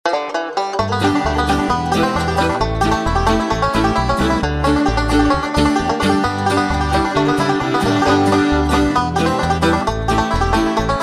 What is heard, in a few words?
Salty Dog Blues